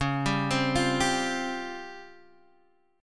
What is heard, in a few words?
Db Major 7th Flat 5th, Guitar Open G